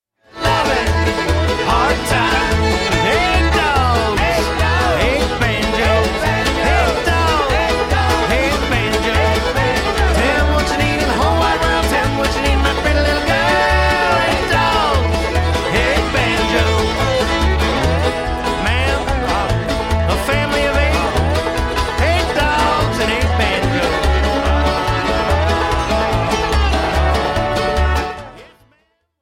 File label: 8 dogs 8 banjos